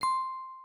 shutter; NekoRetro (SK)

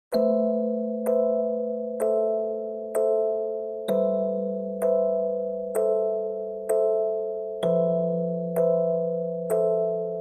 pause loop

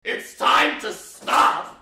fail sound; o!mania v3 (Bars)

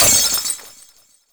combo break; [EZ] Blueberry (ekoro edit)

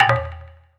section fail; Relos

